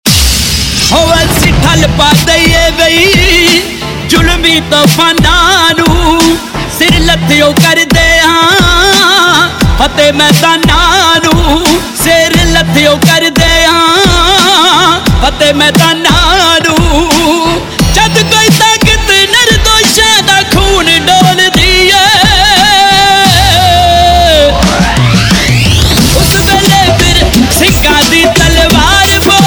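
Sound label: Ringtone, Punjabi Pop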